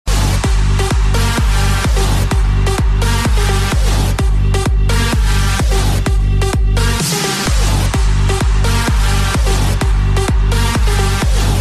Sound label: house music ringtone